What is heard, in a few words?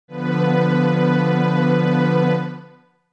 B Diminished